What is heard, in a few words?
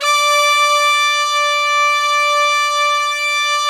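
brass section; Zero-G; Phantom Horns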